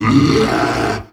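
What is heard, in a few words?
brute attack, creature; GammaAge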